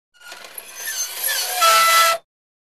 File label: Gate Squeaks Highpit